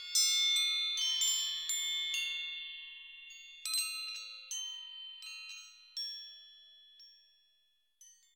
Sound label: Chimes mysterious